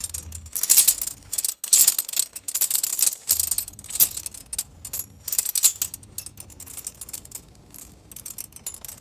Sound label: metallic chain link cage rattling; boats